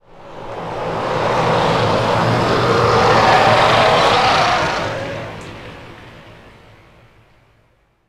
Tie fighter

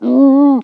gurp

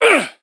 anger

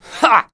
push fail

